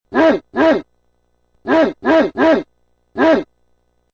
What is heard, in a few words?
Dog barking sound effect of six barks.; Huge Dog Barking; Dogs